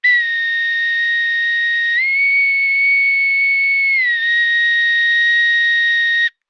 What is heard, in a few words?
Boatswains Whistle